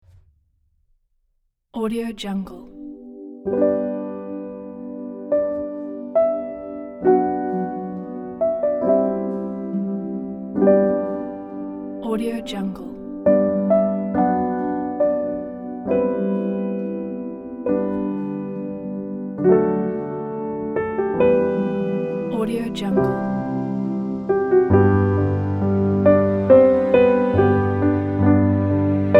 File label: emotions and inspiration